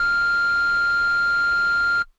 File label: Air Bass Kawaii F5; keys